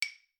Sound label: Claves hi